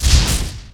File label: general attack; zuus